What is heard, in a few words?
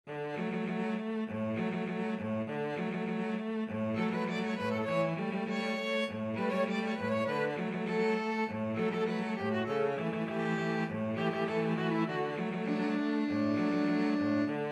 violin cello duet